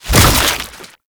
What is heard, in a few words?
Infested Shotgun Reload; Weapons